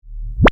Beep Electronic, Scifi, Beep, Electronic, Science, Fiction; Tech; BlastWave